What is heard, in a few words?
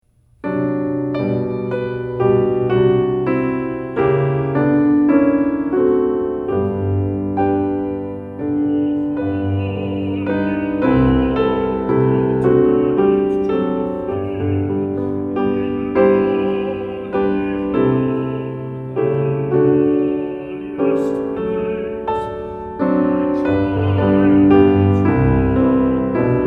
Piano; Oh Holy Lord Content To FIll